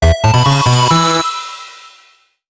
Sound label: Music New High Score; Dungeon League